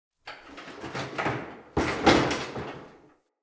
Witch Station Workshop Rattle

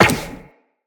Run Zipline, Footsteps; Tenno

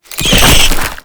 Purification Weakpoint Appear; Warframe; Nineteeen Ninety Nine; Infested Survival

